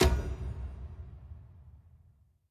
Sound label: coin Value Change; mercy of the gods